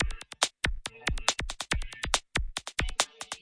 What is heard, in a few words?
140 bpm super electro